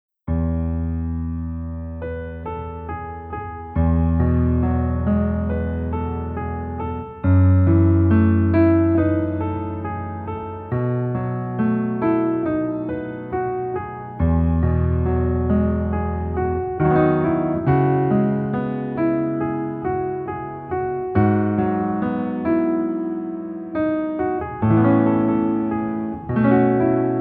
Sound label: Piano